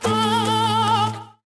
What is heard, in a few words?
fly; lego my style preschool